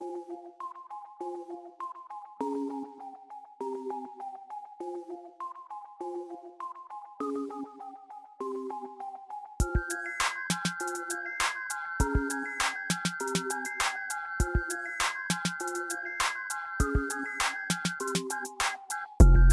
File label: The arrangement, latin trap